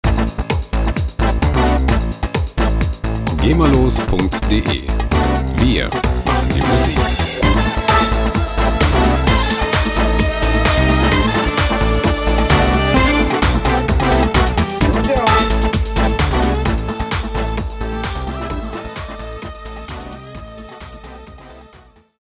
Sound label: House Music; Chicago House; 130 bpm